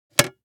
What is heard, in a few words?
Paint can open removing the lid